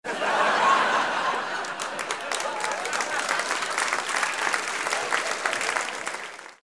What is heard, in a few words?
laugh applause